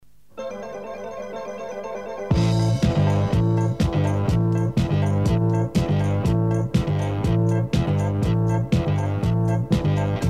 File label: Jingles; 40 Principales